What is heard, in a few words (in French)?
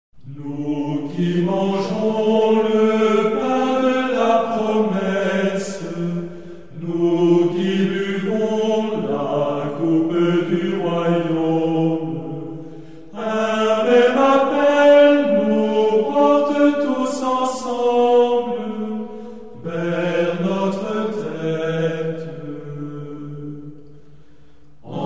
Chants Paroisse